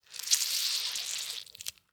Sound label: Orange Hard Squeeze